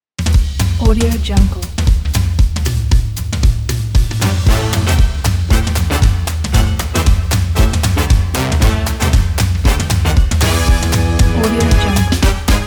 upbeat jazz intro show

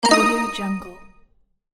puzzle sound

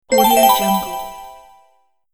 bonus

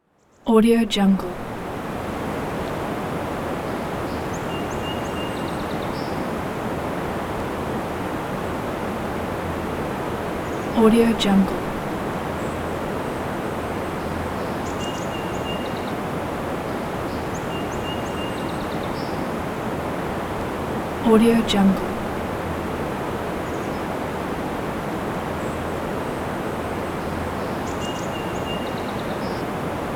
night river nois

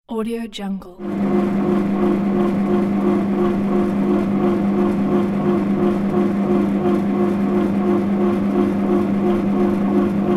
bread maker

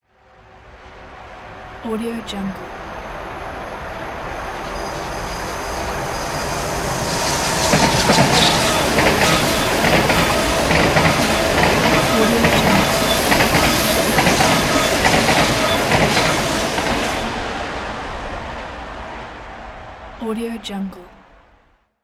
train passing close

